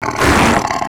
imp attack melee, monsters; DoomRTX; imp5

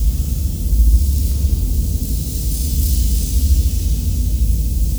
cryo loop verb; Quake 4 Alpha; id software

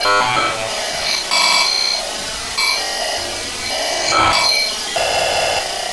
computer broken; Quake 4 Alpha; id software

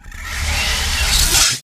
1shot teleporter, electronics; Doom

